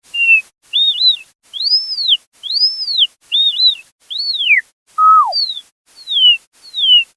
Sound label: pup song